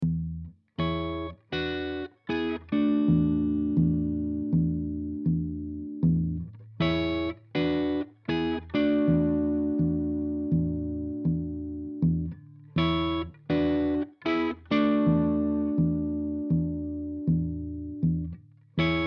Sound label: rhythm only